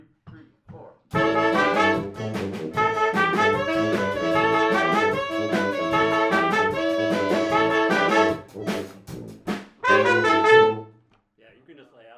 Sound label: white Beat